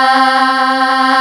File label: SAMPLE VOX